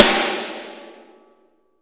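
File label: SN, snare; Best Service; Real Mega Drums VOL-1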